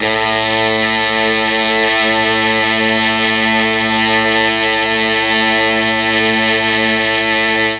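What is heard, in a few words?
A1 strings brass, Mellotron; Mike Pinder - Mellotron Archive CD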